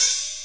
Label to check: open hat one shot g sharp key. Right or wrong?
Right